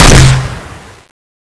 petrol boomer shoot

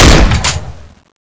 bouncer, weapons

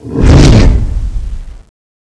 attack, giant; zombie

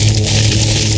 patrol drone attack loop, weapons

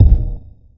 kick; ze house of prayer

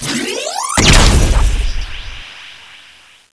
void pistol blackhole start

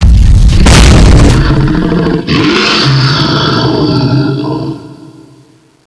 revenant appear; zombie giant

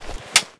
sg552 clip in, weapons; buff sg552ex

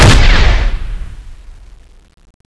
piano gun, weapons

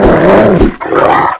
zombie dog attack; meatwall

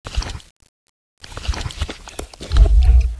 roar, kraken; CSO KrakenBoss

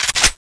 fiveseven draw, weapons

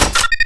sb mine drop, weapons

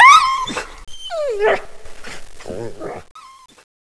zombie dog death; meatwall

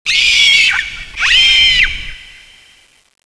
death; CSO Cronowing; cronobotics54

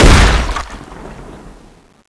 mgsm-1 exp, weapons

